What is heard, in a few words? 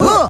"huh"